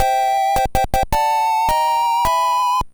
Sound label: Course Clear; super mario maker 2; Super Mario Land